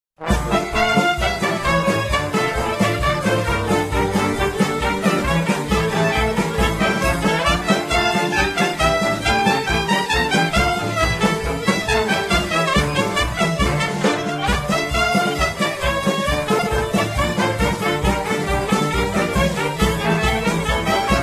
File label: klezmer band, freylach; temple sinai